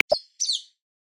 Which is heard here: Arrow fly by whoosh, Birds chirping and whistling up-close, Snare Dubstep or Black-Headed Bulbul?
Black-Headed Bulbul